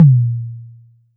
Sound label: Tom; TRX